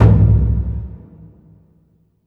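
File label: Burundi drum, Heart of Africa; Spectrasonics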